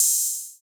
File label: 808 & 909 Kit CRASH; Roland Juno-D